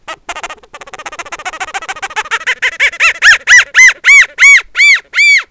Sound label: monkey